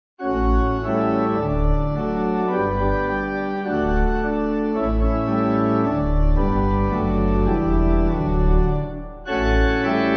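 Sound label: Pipe, hymn backing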